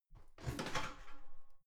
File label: garbage can open lid hard squeak